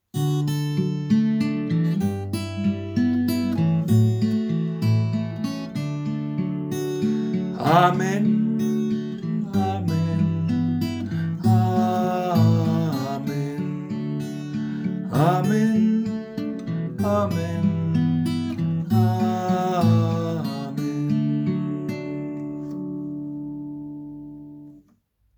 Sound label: Amen